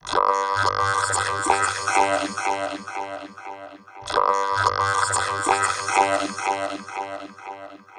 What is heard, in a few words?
vocals; Evolution